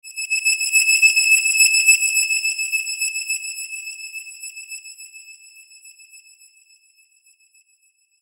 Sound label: Shimmer Swell Vibrato Reverb; Sound Design; Blastwave